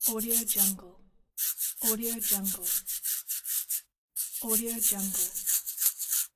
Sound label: scratching head